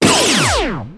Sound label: synth laser; Earth and Beyond; EA Games